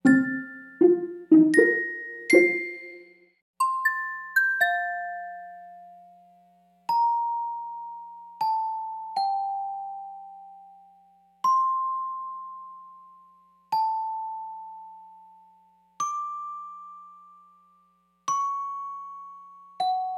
Main Street Night (Rainy)

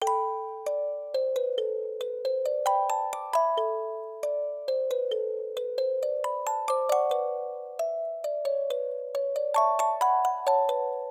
D&B (Music Box)

